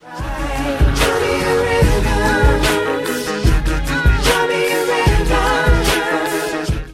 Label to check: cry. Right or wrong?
Right